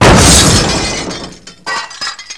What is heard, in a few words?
error; ZEITNAHME SOFTWARE